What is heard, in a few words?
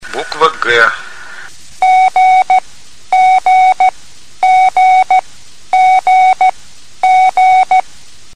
CW